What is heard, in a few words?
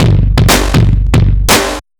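Distorted Kick & Snare, right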